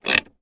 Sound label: car handbrake apply peugeot 307; transportation